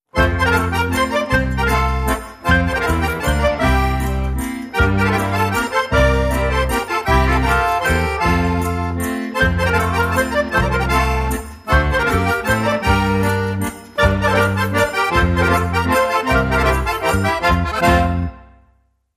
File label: Trottarella, Jingle